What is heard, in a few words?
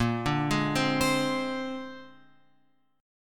A sharp 9th, chord; A#9 Chord {6 5 6 5 X 8} arpeggiated